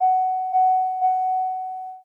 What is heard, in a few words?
alarm, highway